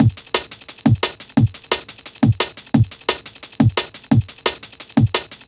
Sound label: classic dnb breaks, A, Lo, 175